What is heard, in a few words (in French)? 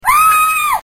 Cri